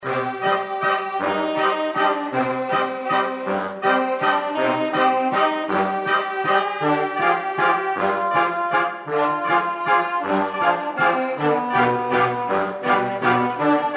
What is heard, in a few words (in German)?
Walzer